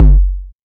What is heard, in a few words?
808 Kick; Novation Drumstation